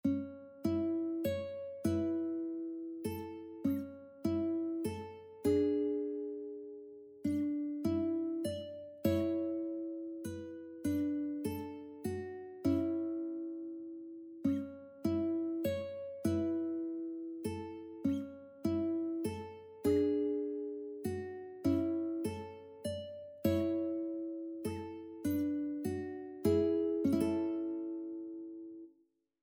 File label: Ukulele; Rock-A-Bye Baby